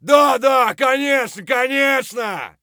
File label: fight; Escape from Tarkov